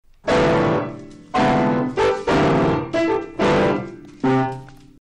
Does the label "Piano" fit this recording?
Yes